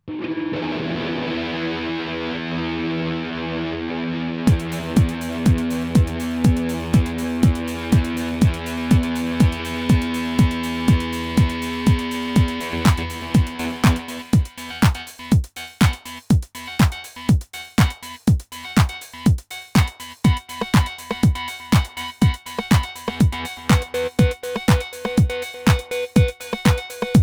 Flera Gitarr spår separat triggade av trummaskin med hjälp av gate

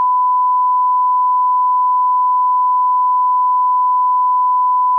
Sinus

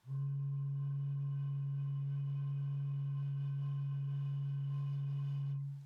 flaska

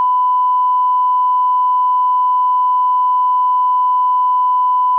Sinus

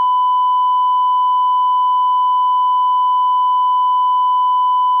5% 3e tons distr

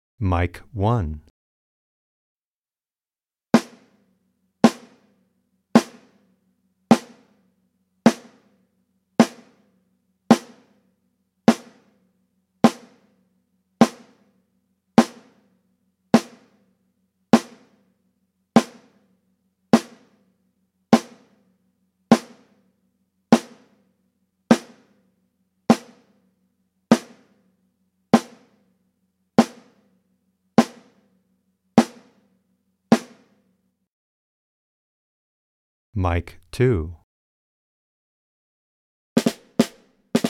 Virvel

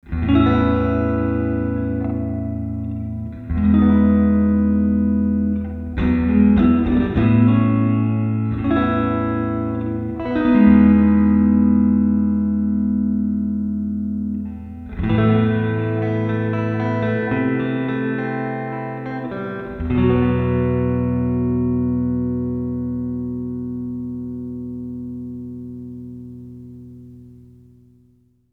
Gitarr digitaltreverb computerplugin

